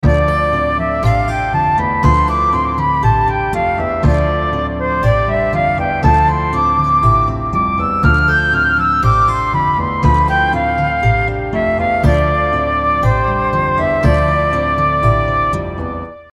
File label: dorian simplest